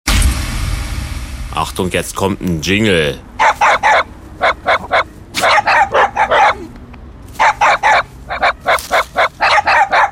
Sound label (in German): X-Mas Jingle; Fritz